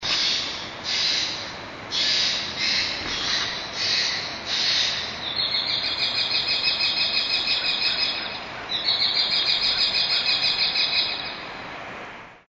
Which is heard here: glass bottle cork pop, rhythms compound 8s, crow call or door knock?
crow call